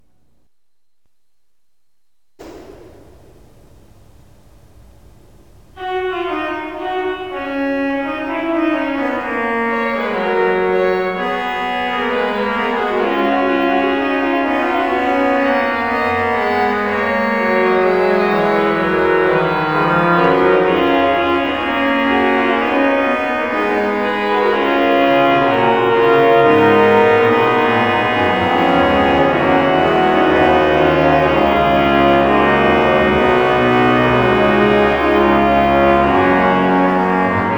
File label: couperin fugue